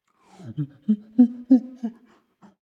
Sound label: Monkey Lose; Pitch Black Audio Pong